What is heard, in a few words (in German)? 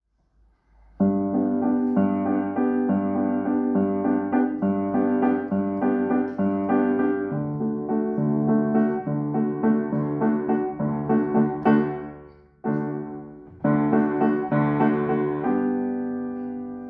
Klavier; Ay del chiquirritin